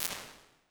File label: drum room expander; Made for Drums; Ableton; Convolution Reverb